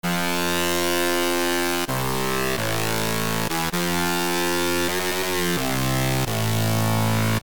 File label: Acid Hyper